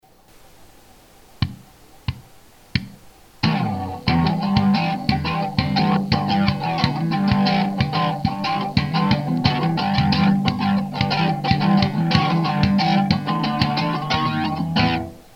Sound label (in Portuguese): funk